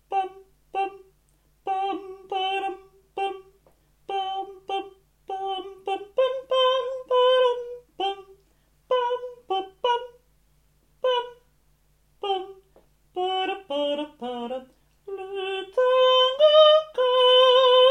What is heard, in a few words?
tenors